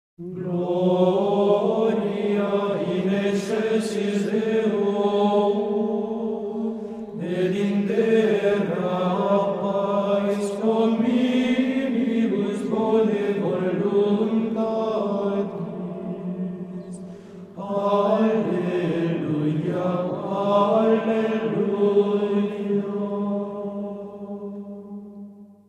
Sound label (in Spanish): Coro De Monjes Del Monasterio Benedictino